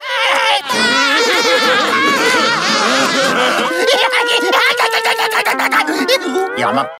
all laugh; Spongebob

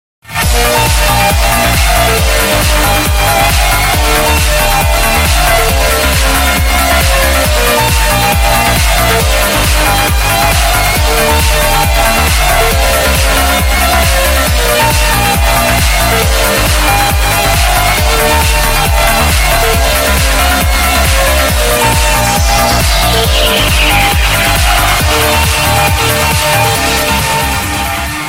Uplifting trance

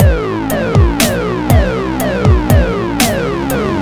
Funky Dank Boi Beat

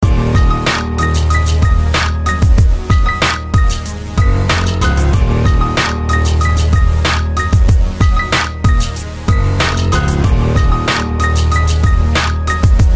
new style

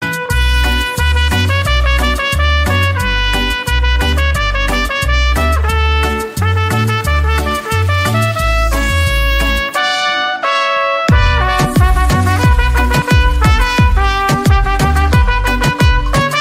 trumpet

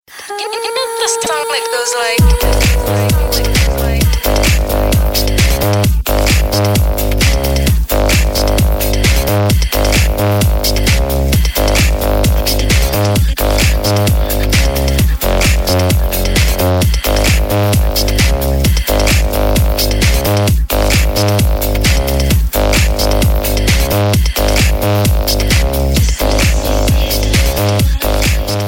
Electrobounce

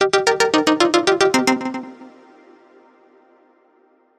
Minimal

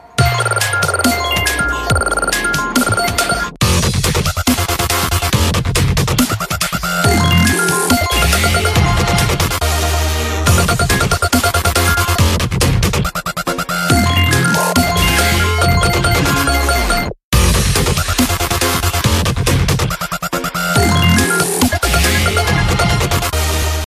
Dubstep

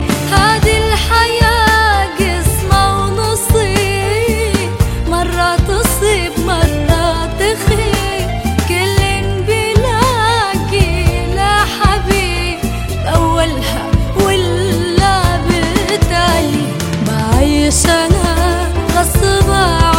Arabic

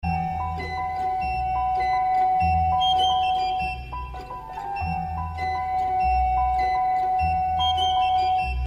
Sms Flute

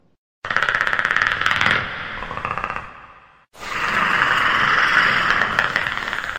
Predator Sound

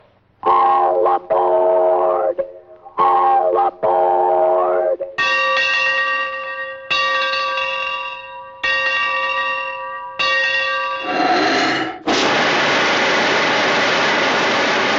Train Alarm; Casey Jr

